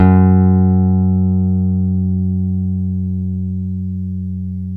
PICK BASS

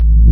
noise, FX & ATMO, ALARMS & ALERTS; Techno Trance Essentials

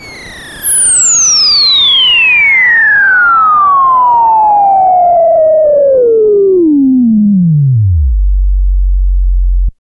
FX SWEEP, SYNTHSWEEPS

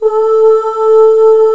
A4 FEMALE

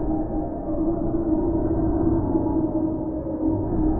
Sample n Hold Verb Loops, sparse soundscape; SnH Verbs

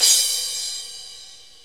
TICTALK DRUM

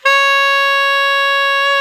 SOP SAX C#

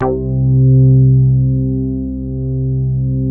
C3 RAP BASS, NY SYNTH; Northstar - New Gold VOL-2

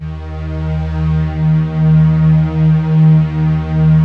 PROPHET VS, Vintage Keyboards

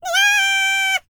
BLACK SEA, World Voices; ILIO; Vocal Planet VOL-5

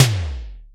Electronic Tom, Drum Machines Roland R-5

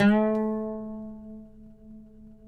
PLK OUD, Roland LA Composer

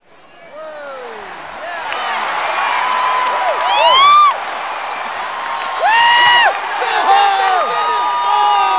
Baseball Crowd; SFX

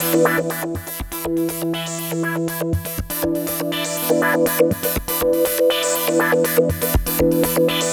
Progressive Synth, Trance

